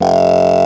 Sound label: tuners